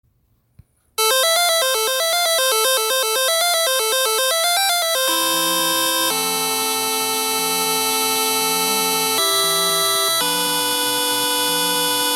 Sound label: 8bit Theme